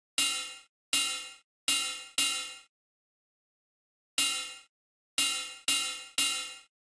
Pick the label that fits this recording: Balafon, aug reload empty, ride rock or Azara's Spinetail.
ride rock